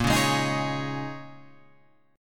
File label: A sharp Minor 11th, Guitar, Open F; strummed; JGuitar